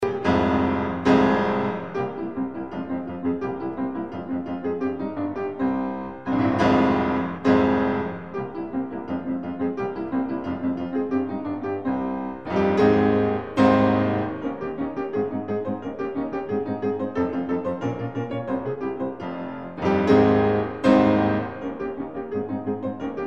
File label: Beethoven Appassionata; Klaverisonaat23